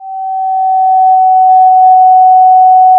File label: Sound of a police car siren